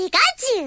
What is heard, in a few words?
pikachu cry